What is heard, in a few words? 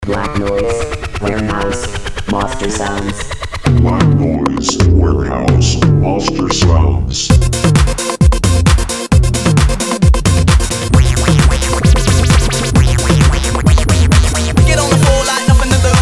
BLACK NOISE WAREHOUSE